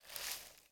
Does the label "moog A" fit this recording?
No